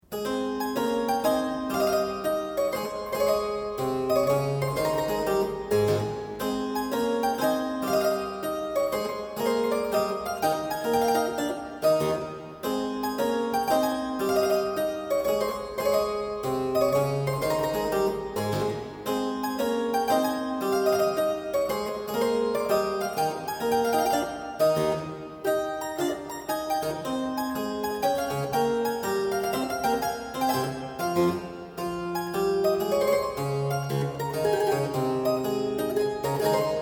Suite in A Major - Sarabrand, Keyboard Music

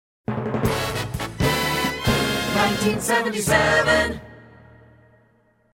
Hits, Drops

